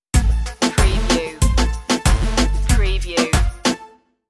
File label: marchase stinger